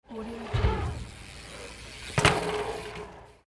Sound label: bicycle kicker ramp jumping; urban sounds